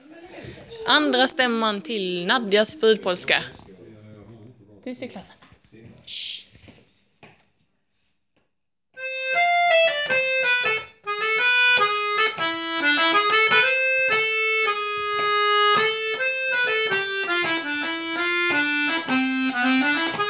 brudpolska stämma

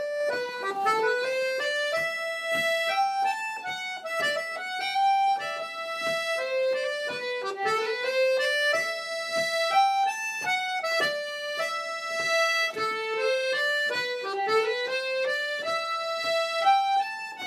Drömpolska

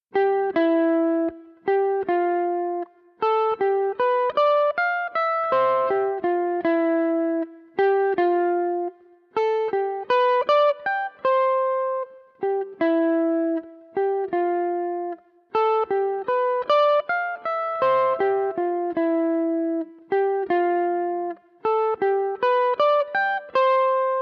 Hopska halvtempo

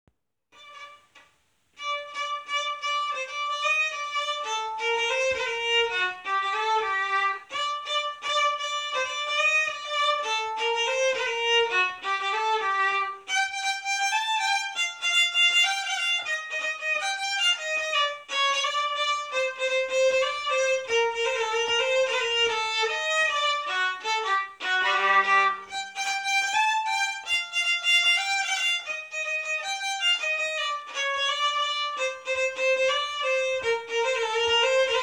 schottis

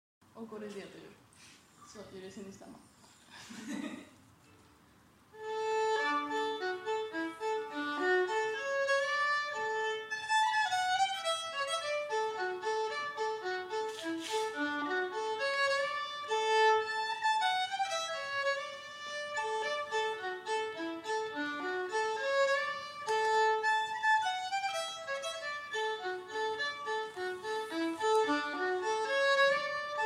Polska fr. ön

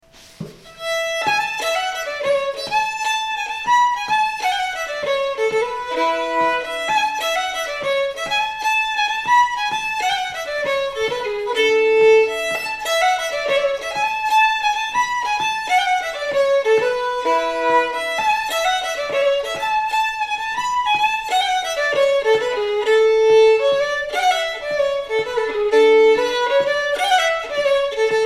polska

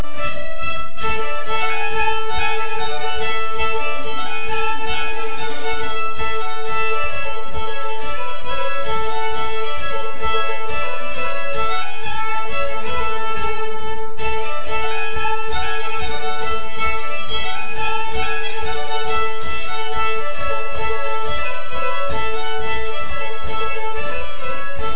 Finnskogspols

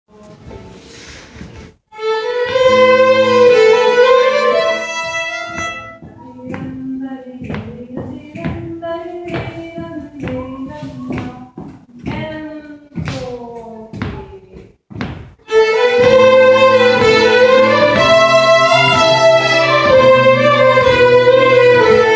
Gettrall

